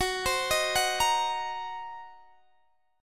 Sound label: Gb 6th Flat 5th Gb down Guitar DADGAD